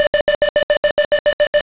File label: stall intermitent; a10cuba